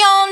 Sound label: vocal